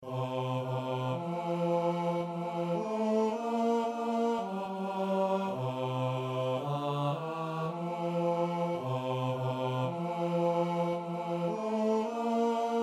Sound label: Chorus Men